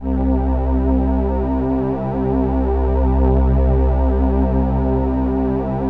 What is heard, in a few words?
LAMBDA, String Machines